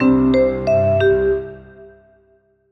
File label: jingle minimal bumper